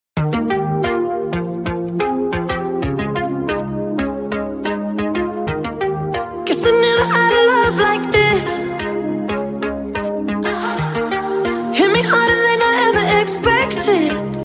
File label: english music ringtone